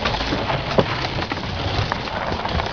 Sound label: surface branches loop